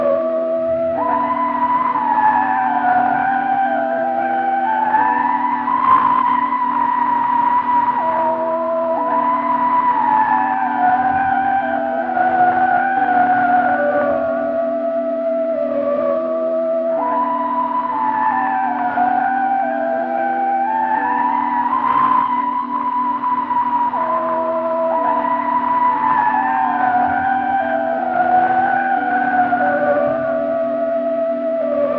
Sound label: instrument; OuterWildsModManager; OWML; AnonymousStrangerOW.TheStrangerTheyAre